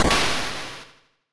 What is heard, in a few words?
Mech Blow